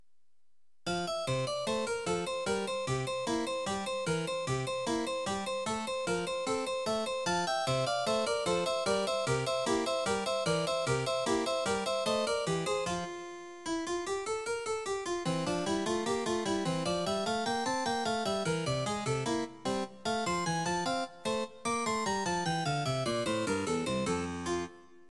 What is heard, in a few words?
music box